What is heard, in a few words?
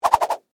Whip Whoosh Multi, Throw; Foley; Whip Sound Effects; Whips (Sound Effects:Objects)